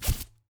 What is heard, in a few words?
Rope Full